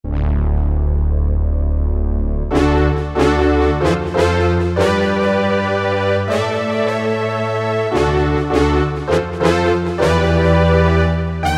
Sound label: Jupiters, Roland U-220; Factory Patches